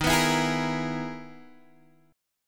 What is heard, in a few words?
E-Major Sharp 11th D sharp